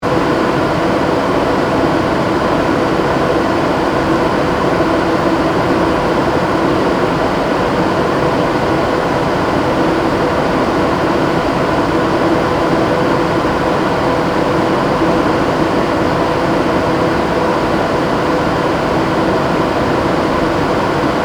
chiller; La Silla ESO 3.6-metre-telescope chiller; mono; soundscape

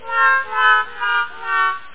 software guiding stop; paranal

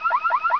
software car alarm; paranal; soundscape

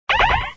software blip; paranal; mono

